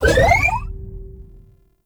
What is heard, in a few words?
Crew Ship Acceleration, Engines; Warframe; RailJack; Corpus Crew Ship